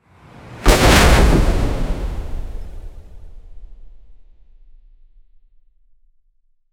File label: fast wooden object throw; physical